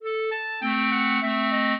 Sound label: clarinet; Mozart